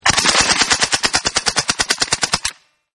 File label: Uzi Silencer, Uzi Submachine Gun With Silencer Attached Burst Fire; Machine Guns